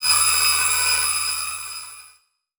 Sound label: Transition Feedback Electronic